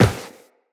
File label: Run Metal, Footsteps; Tenno